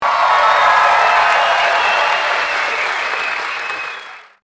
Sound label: event sound